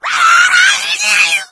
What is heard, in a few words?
rage at victim, infected female